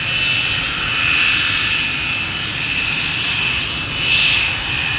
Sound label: shuttle; source